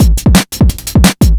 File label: breakcore breaks